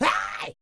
perc voice, Roland MC-909

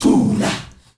THOO LAHH R, GRP CHANT; Spectrasonics; Heart of Africa 1

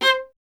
viola C; Miroslav Vitous; Solo Instruments VOL-1

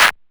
Clap, DIGITAL KIT

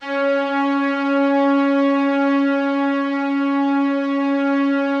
C#3, STRINGS; Yamaha VP-1; Music Store; JODYSTRINGS

